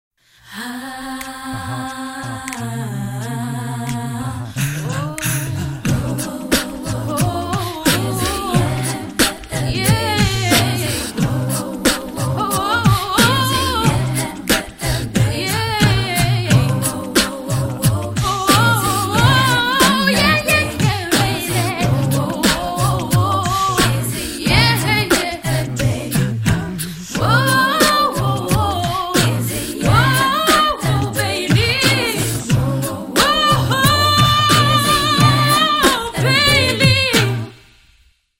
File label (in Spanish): interludio acapella